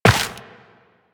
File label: Step Dirt, Footsteps Walk; DE; Warframe; Tenno